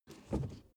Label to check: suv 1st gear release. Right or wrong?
Right